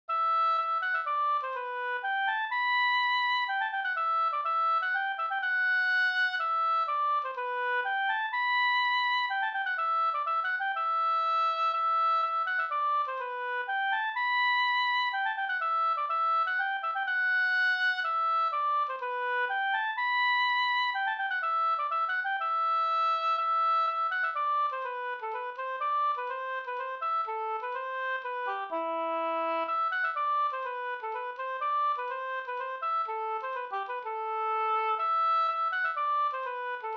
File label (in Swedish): polska Springlek